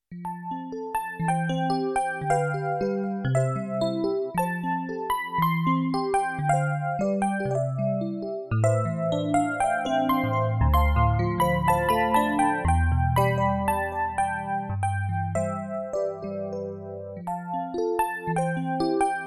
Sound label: Epiano